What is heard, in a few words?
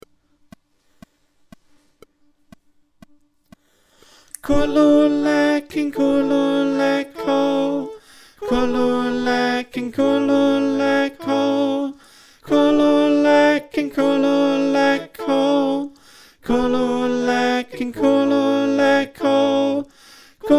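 Backing Chant Tenor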